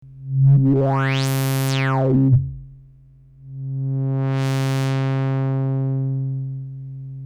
form low; emu workshop

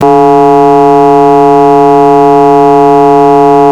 sin fm; emu workshop

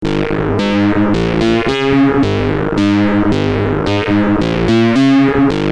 ringmod

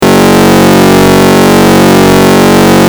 high fm; emu workshop

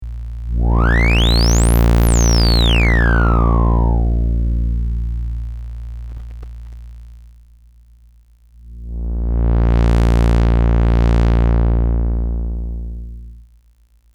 diode ladder; emu workshop

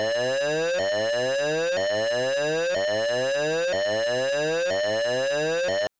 up down sync; emu workshop

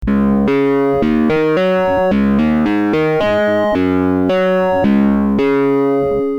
ringmod

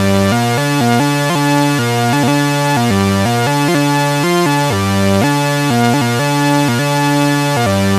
lfo mix; emu workshop

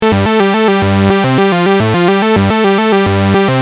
2 vco saw; emu workshop

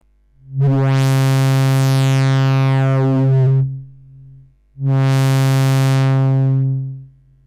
ms20 low; emu workshop

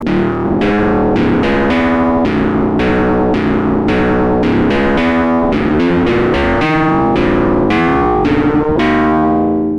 ringmod

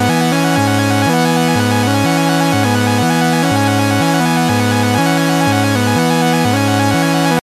lfo mix; emu workshop

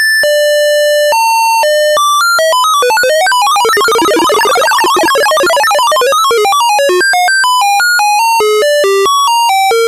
sh vco; emu workshop

